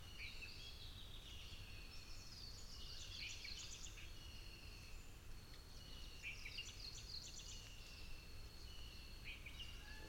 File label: Red junglefowl; مرغ جنگلی سرخ